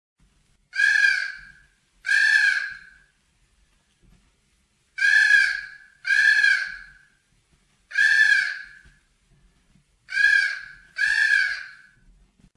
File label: birdz